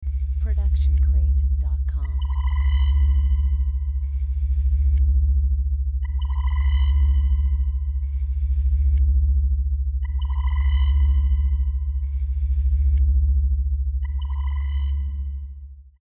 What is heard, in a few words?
SciFi Machinery; SoundsCrate